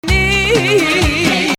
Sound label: Hijazkar Nakriz